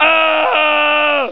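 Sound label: scream; duke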